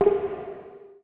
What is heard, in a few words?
industry hit e4; fx; PC Gamer IT CD 40; SMACDEMO